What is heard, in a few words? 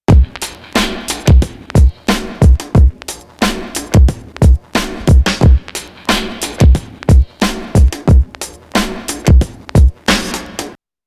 Loop Single Fast